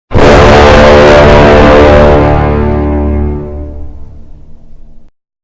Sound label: very loud horn